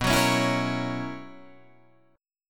B Major 7th Suspended 2nd Suspended 4th B down Guitar Drop D